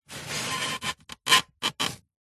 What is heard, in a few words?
foley polystyrene sheet flat thin scrape creak